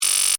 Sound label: Beep Buzzer Alarm, Scifi, Beep, Buzzer, Alarm, Short, Science, Fiction; Tech; Blast Wave